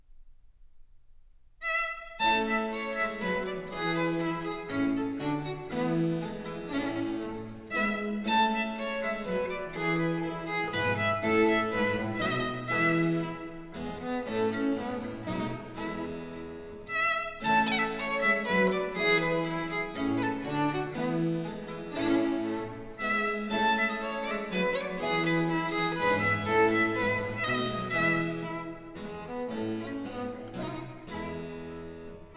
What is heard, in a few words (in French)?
Pièces de violes, Sonata in B-Flat Major; Book 2; Nicola Matteis; Ayres for the Violin; Quatrième Livre (Paris 1717)